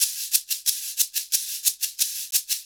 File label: 90 SHAKER